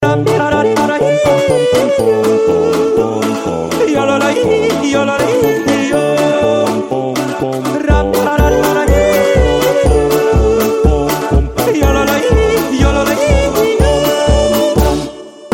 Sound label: yodeling folk medium